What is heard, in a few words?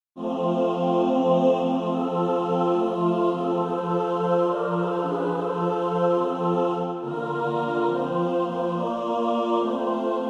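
mixed, practice music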